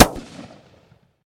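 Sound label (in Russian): резкий глухой протяжный звук выстрела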